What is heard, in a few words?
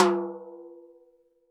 TD-20 Tom B, Roland TD-20 Toms